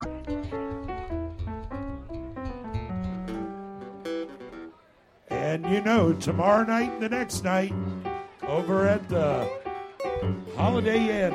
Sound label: tuning intro